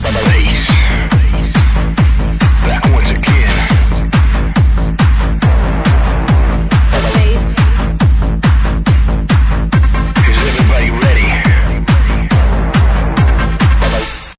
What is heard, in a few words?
Bounce Bass Beats Melody; Brooklyn